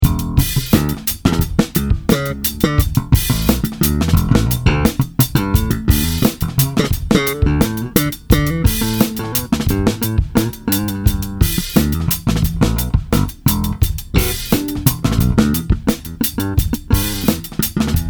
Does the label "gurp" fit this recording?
No